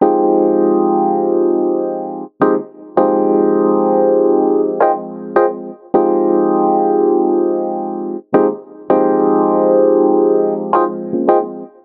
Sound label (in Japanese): エレピ